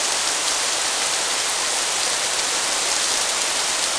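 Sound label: waterfall small loop; water splashes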